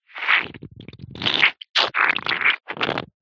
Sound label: foley liquid frothy squirt squelchy